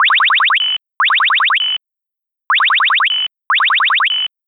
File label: Bolt Scooter Signal